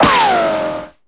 SE GAME BREAK; maimai

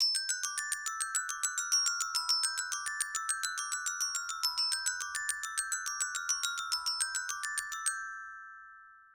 gloken bgm

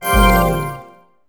RESULT GEM LOSS; maimai

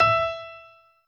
piano

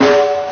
Big snare; 神（59岁）

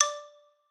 xylo

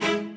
strings

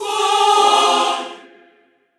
chor

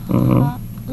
hmm…; 英会話凸

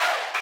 crowd

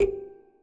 synth

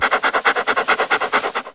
SE GAME SLIDE; maimai

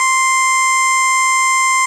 Lead C7; 刘醒哥

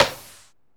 SE GAME GOOD; maimai

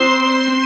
C5 String

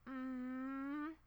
Hmmmmm; Alice

